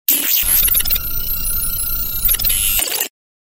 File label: Galery Noise; Sound Ideas - Galery Noise 002